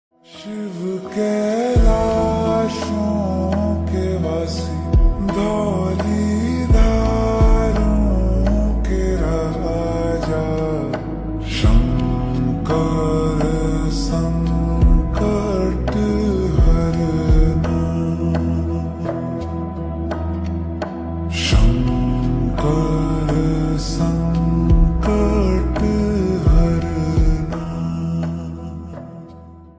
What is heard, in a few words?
Slowed Reverb Ringtone